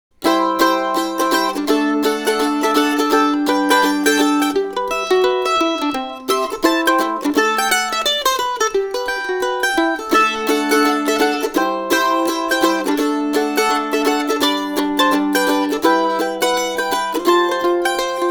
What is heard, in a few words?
mandolin